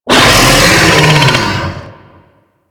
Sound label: Infested Bone Blade Vocal I; Warframe